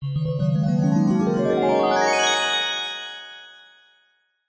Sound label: fantasy magical glissando bells mallets; zapsplat